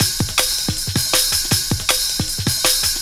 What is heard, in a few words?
Break, Loops; M8